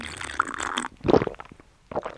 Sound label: antibiotic; Original TK Sounds